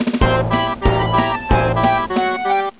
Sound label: calliope